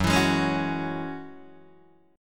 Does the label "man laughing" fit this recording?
No